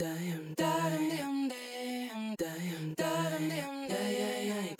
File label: Effected Vocals Chorus; Multitrack